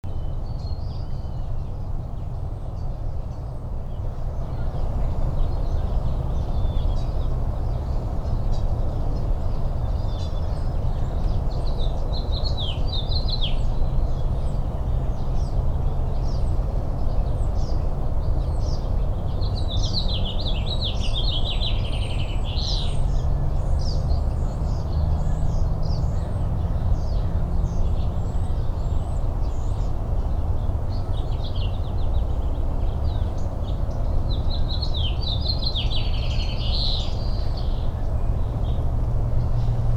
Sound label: Morning Birds and Rain, Richland WA